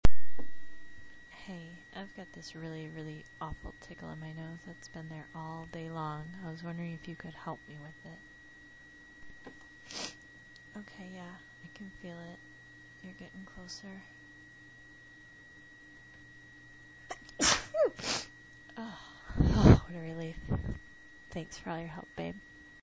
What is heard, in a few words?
help me, female